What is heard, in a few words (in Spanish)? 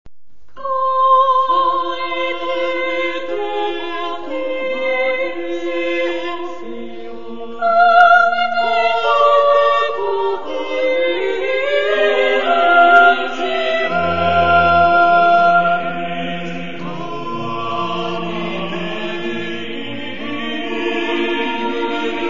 polifonia